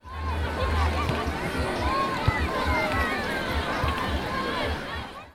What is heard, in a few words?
volley